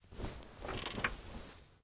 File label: Go To Bed; LOOPERS